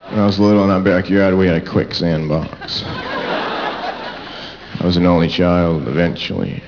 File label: quicksand